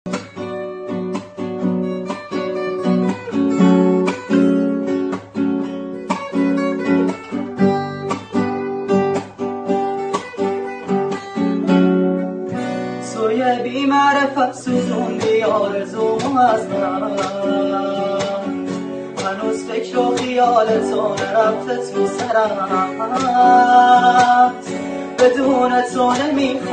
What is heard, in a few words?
Guitar